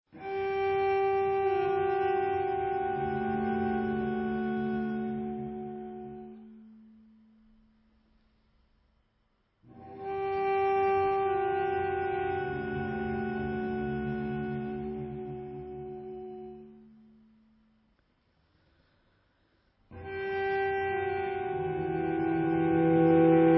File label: Music for Cello